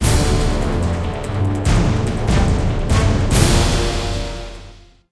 round lose; music

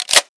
slide back, AR-10T

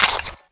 p345 mag out; csof

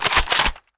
scout bolt, weapons; czero opforce

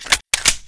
usp clip in; czero opforce

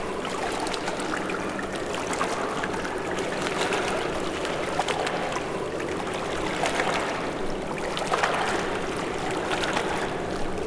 lapping water; dmc

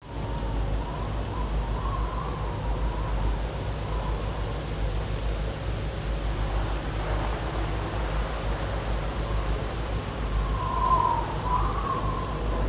ambient outside; valve; moab compo27

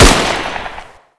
galil, weapons

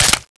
clip out, HK416c

